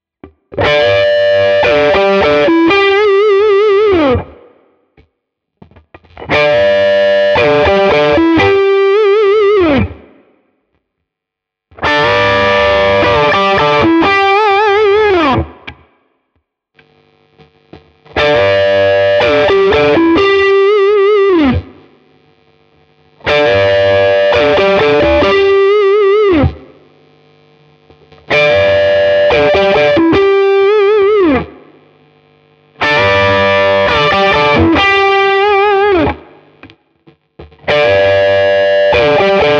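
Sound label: Hagström mid gain Lick all positions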